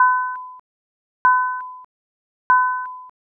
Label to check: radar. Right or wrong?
Right